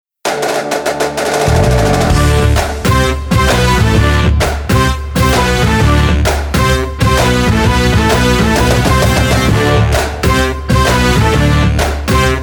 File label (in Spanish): Música de marcha